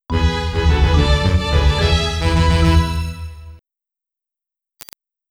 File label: Level Completed; Marko's Magic Football; SCD